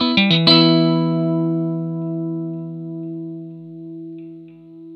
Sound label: blues guitar riff 120, Vintage Blues Guitar